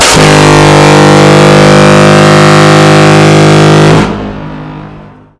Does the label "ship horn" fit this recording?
Yes